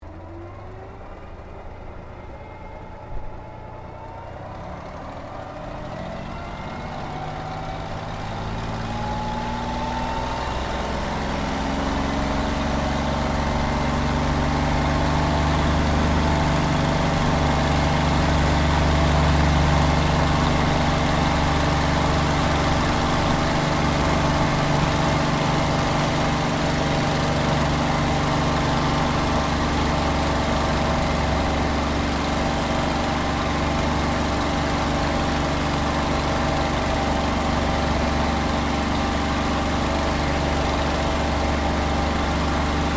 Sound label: AIRCRAFT P-40 KITTYHAWK TAKEOFF INTERIOR; P-40 Kittyhawk / Warhawk / Tomahawk; Airplanes (Sound Effects:Vehicles)